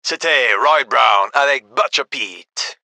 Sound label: music